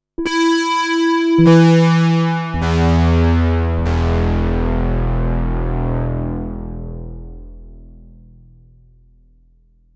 Detune

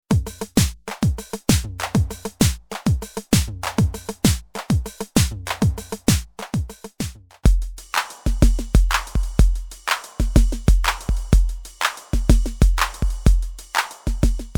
TR8 Scales

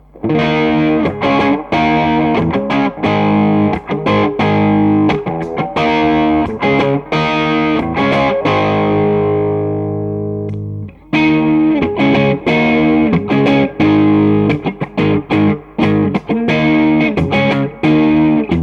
Drive

